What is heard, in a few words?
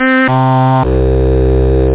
Bassoon; Dr. T's Music Software IFF Samples For Use With Tiger Cub